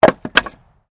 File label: Wood Drop; Material components for constructing more complex sounds; Materials